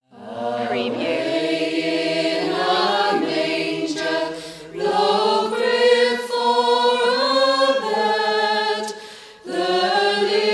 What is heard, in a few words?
away in a manger choir